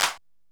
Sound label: drum, drumcomputer EMU SP 12; Best Service; ProSamples vol.55; Retro Sampler; EMU SP 12+1200